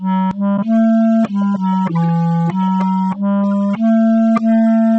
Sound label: separated clarinet